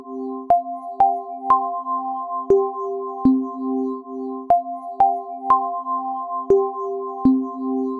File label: Arp; Sony; ACID Pro 7.0